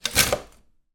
household kitchen cooking utensil place in holder between others